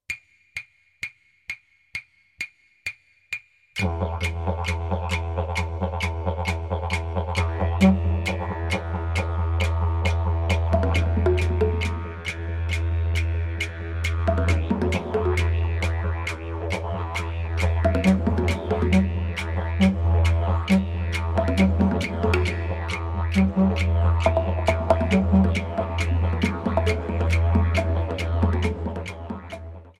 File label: Sticks